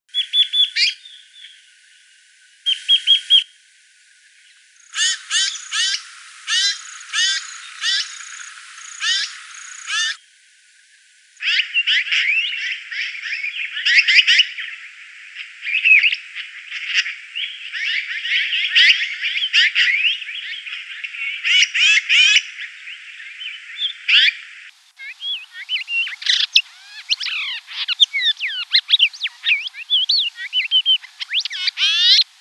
dusky woodswallow; woodswallows butcherbirds currawongs and magpie

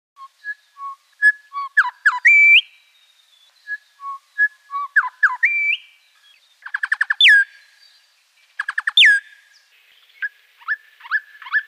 grey Shrikethrush; whistlers shrike thrush; canberra garden birds

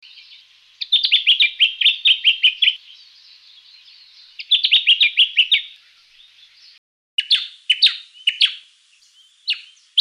yellow faced honeyeater; honeyeaters; canberra garden birds

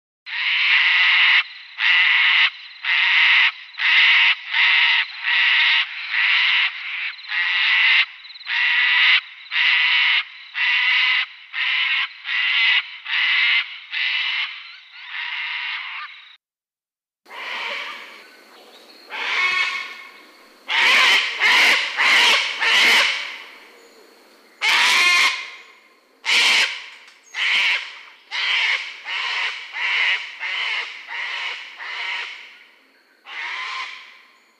sulphur Crested Cockatoo

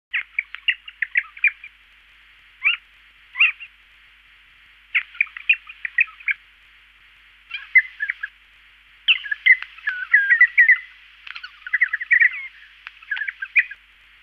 regent Honeyeater; honeyeaters; canberra garden birds

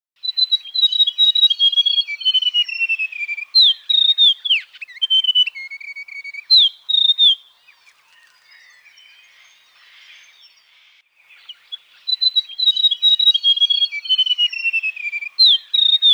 White throated Gerygone; scrubwrens gerygones thornbills pardalotes and allied birds

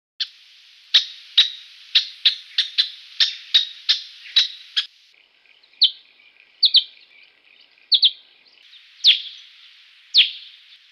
new holland honeyeater; honeyeaters; canberra garden birds

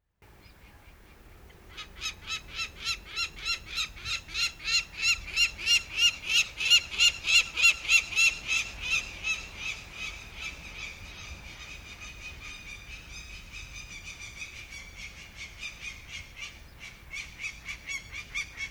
Peregrine Falcon; raptors; canberra garden birds

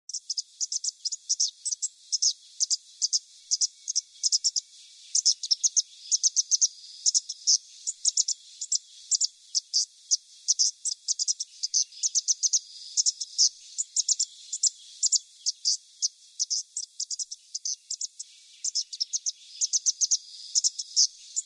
yellow Thornbill; scrubwrens gerygones thornbills pardalotes and allied birds

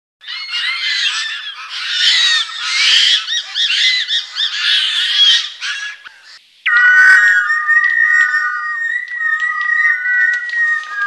Chough; canberra garden birds

